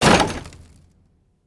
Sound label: Door Slam